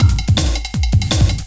Rat 162, breaks

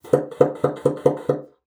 BASSOON; Xsample VOL-6

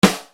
Snare Dr

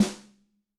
Parade S, Snare; Yamaha DTXpress-II

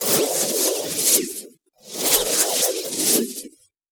Nylon Swish; Sonic Foundry (Sony Creative Software); Myths of Technology

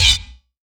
Yamaha CS1x Drums

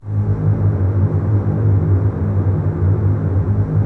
G#1, Basses Tremolo, String Ensembles; Miroslav Vitous; 90 s Sample CDs

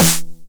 909 SD Tape Sat

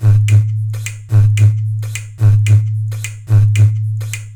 MATKA, Indian Traditions; USB Soundscan vol.45; AKAI

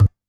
DJEMBE, Yamaha DD-65

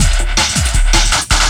LOOP, 160 BPM, Drum & Bass

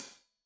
Hat Loose, Hi-Hat, Cymbals Stereo

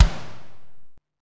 KOMMERZDRUMS

